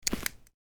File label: Auto; goldenClover